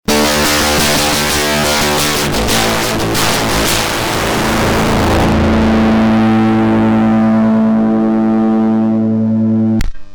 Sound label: Behringer MIC300 gtr Gain max Out max